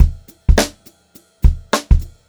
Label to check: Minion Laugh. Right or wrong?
Wrong